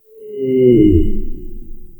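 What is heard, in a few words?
a single drawn out ethereal, vocalizations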